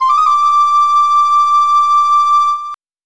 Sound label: Flute; Shin Megami Tensei if...; PlayStation Soundtrack; Megaten